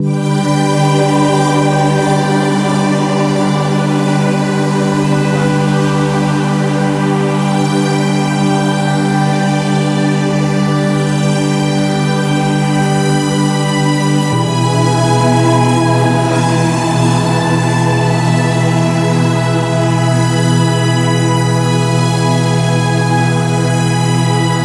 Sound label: Atmosphere of Dreams